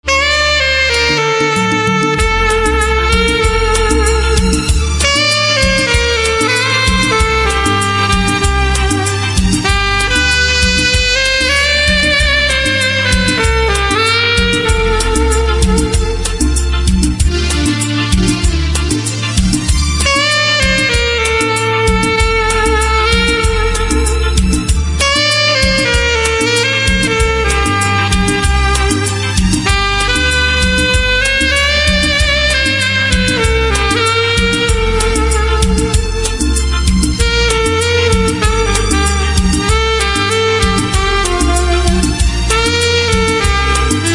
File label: Saxophone, instrumental ringtones